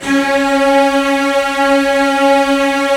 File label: STR, Roland LA Composer; Roland - LA Composer vol. 4